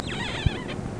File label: Squeaky